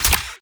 Gun mech Reload

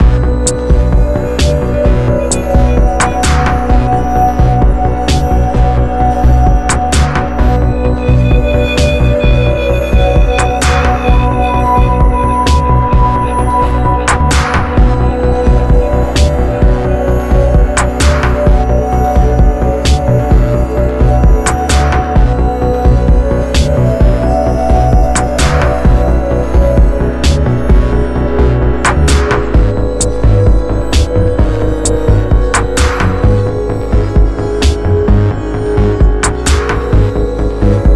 Tense; Everspace 2

